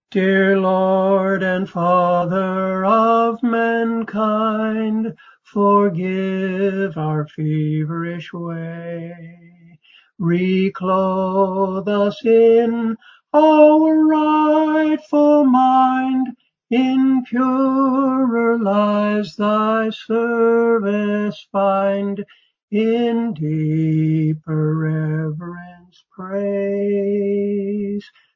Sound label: Prayer Song